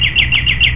chirp

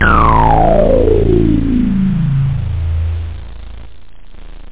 Wet, Acid; AlgoMusic

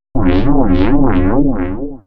wowee, Synth; FreelogHS10

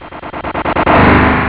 drums

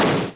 blast; winwar2

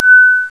Refuel; Zone Warrior

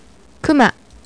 bear

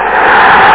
goal roar; top of the league ecs 2

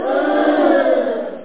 OOOO; piles'o'tiles

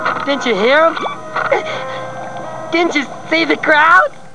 crowd

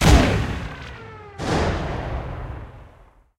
crash ship

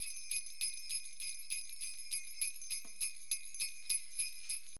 SLEIGH, Perc; LiveAct Pool 1; AcidJazz Funk

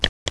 mouseclick; HyperSlider 1.8

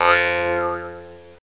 bounce; McDonaldland - Silly Games; McDonald's

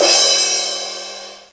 perc crash; Hexen Demo; Hexen Music

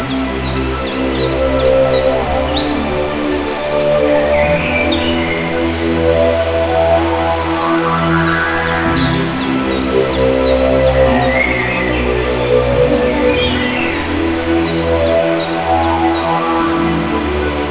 Hill music; Science on CD

